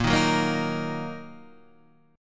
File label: A sharp Suspended 4th Sharp 5th; A#sus4#5 Chord {6 6 4 3 4 6} strummed